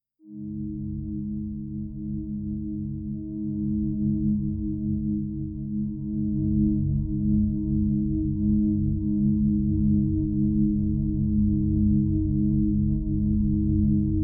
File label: resonant drone